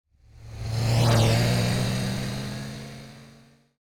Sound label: Car passing by fast